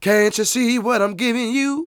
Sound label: SING W, Vocal Planet Blues & Soul, BLUESY; ILIO